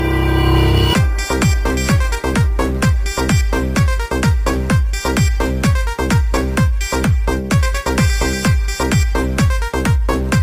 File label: rock dance ringtone, bollywood ringtones